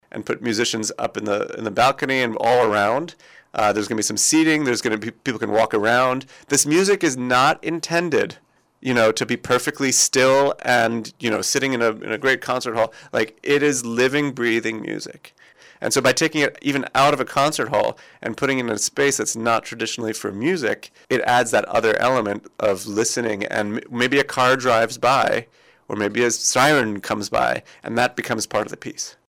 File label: lobby music